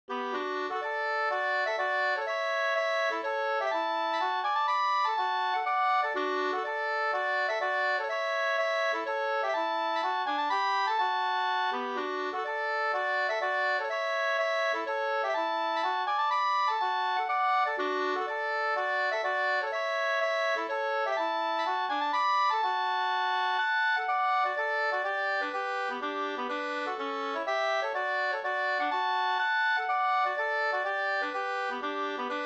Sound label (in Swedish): polska Skomakarepolska